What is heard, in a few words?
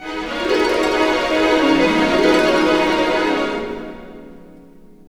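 128, orchestra; East West; Scoring Tools; Fantasma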